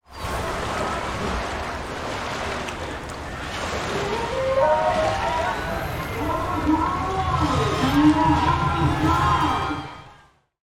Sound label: Lunar City Ambience